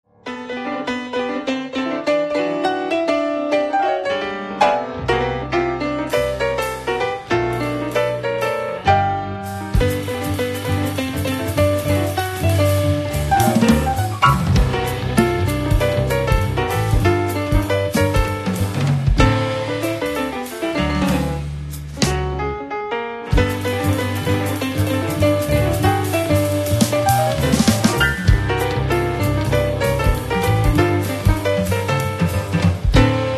piano live

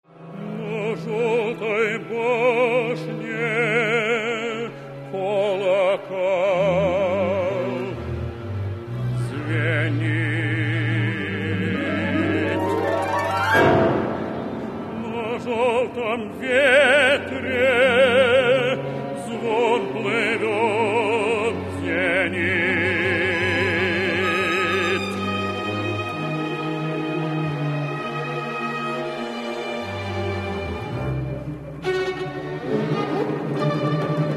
chamber

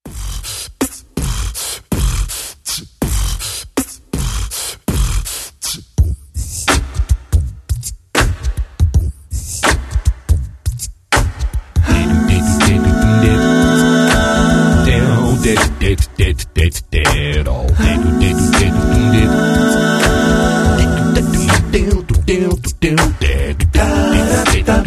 acapellissimo

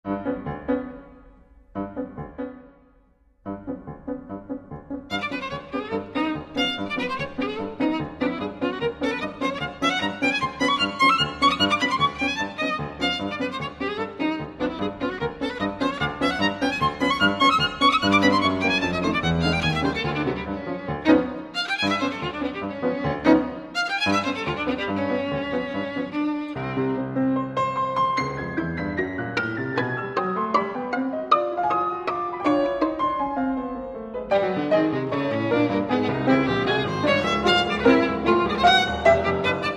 violin piano masterpieces

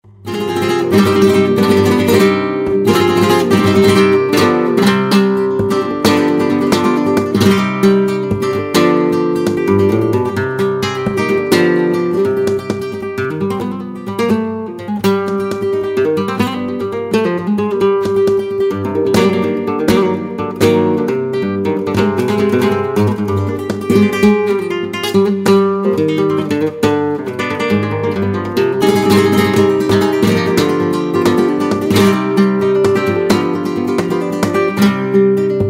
Flamenco Guitar

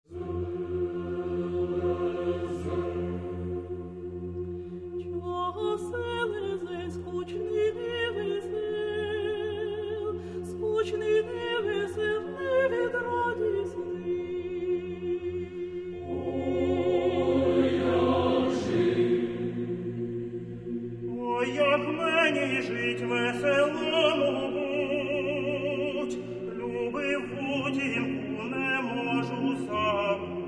choir; NRCU

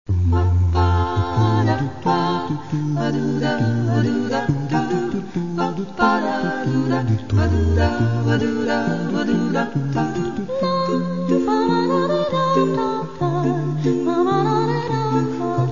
jazz choral

